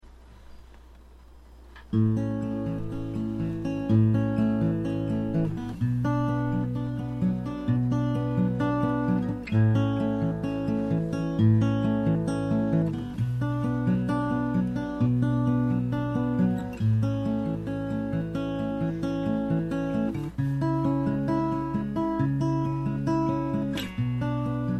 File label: Guitar